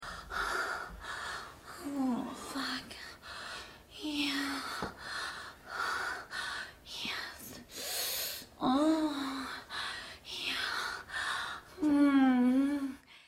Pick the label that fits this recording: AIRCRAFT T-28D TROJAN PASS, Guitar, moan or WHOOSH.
moan